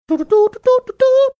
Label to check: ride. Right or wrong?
Wrong